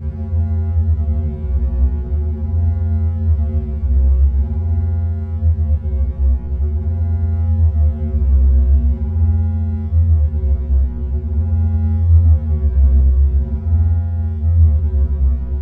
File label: HUM